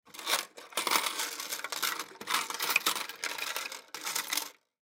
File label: Cash Box Remove Coins; Money; Blastwave